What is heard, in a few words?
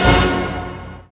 HIT ORCH.; Roland - Brass, Strings, Hits and Combos; Orch.Hits 1